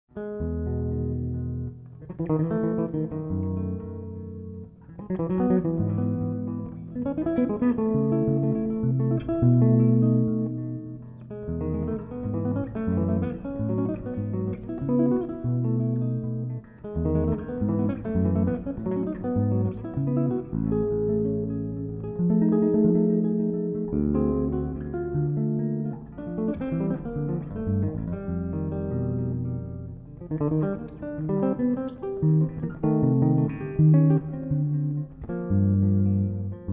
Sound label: Bass Solo